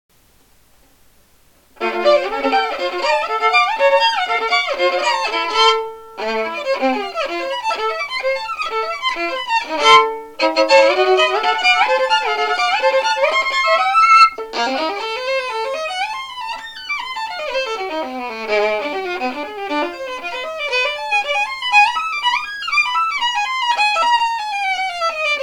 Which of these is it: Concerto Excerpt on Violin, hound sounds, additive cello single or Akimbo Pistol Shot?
Concerto Excerpt on Violin